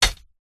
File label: stones small wood batton impact; foley